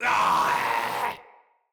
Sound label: Infested Runner Attack Call